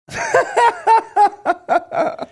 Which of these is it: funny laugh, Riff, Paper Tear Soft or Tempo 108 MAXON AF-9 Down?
funny laugh